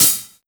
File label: treble heavy hat sample a key; hi-hat